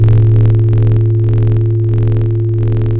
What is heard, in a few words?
pop, Sound of a church bell